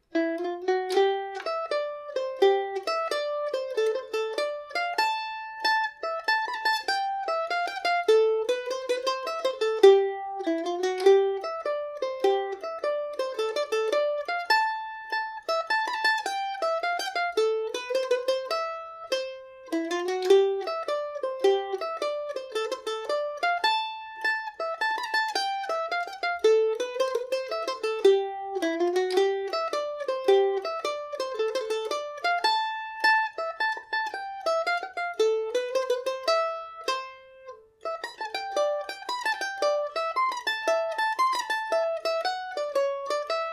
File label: mrs kennys barndance C major and G major, mandolin